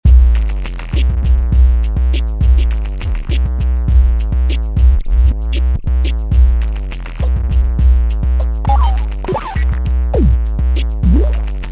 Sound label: Blown Speaker, Roland V-Synth; Factory Patches